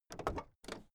car camaro open door

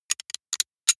sci-fi handling click; comedy-cartoon; pistolen-gewehre

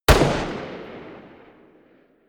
revolver distant shot

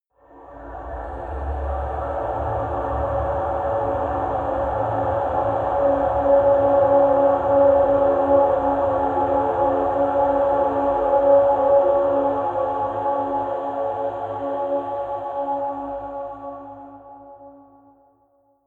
scrapes long scary, horror; atmosphaeren umwelt

